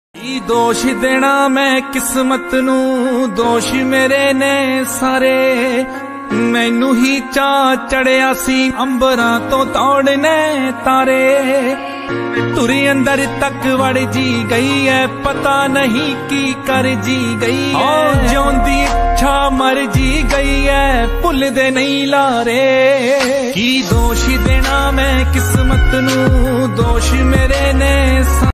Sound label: Punjabi Beats Ringtone